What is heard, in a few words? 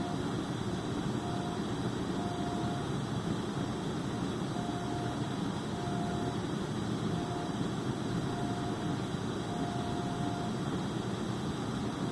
computer fan